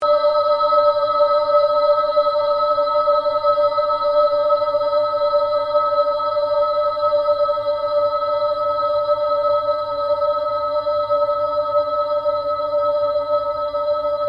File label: Created Drone Fade Long, Drone Sound Effects; sound building blocks created through manipulation of raw material